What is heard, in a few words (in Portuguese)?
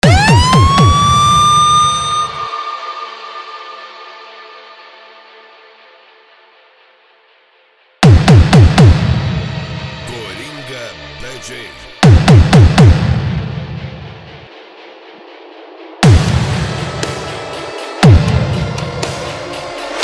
funk proibidao; cd atrevidos sound car vol4